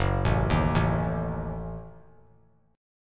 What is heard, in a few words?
F sharp Minor 6th F sharp; arpeggiated; JGuitar